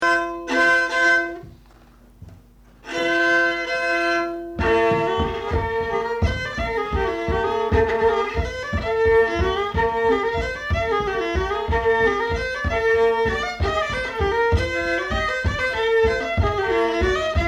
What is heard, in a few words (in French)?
Mazurka; Mazurka de Chabrier